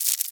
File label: attack, spider